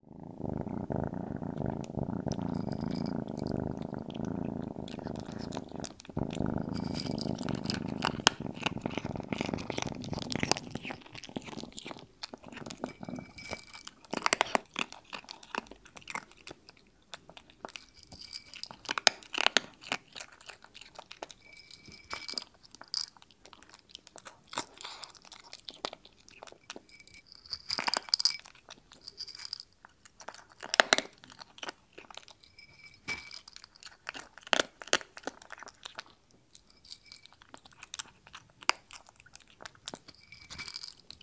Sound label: Kitten Eating Dry Food; افکت صدا حیوانات; Animals